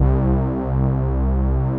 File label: SMOOTH SYN, synths; Pro-Rec - Synthsonic